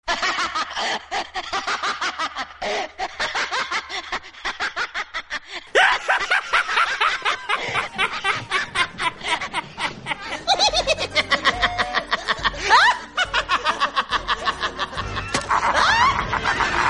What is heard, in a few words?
ghost horror